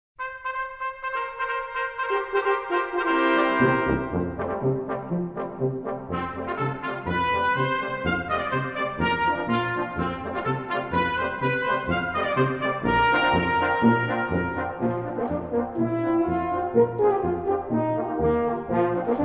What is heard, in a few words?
Children Street Music